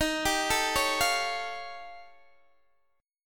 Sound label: Eb 9th Flat 5th, Guitar, Open D